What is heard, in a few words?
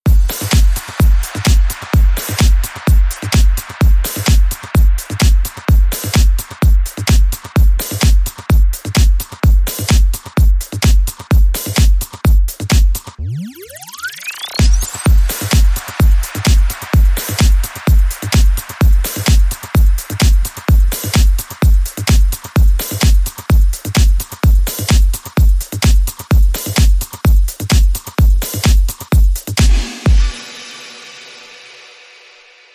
Beat